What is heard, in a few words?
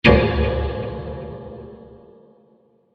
Deep Metal Impact Blast